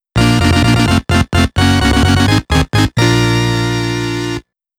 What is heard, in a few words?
Losing (Fanfare); Dream Co,.Ltd.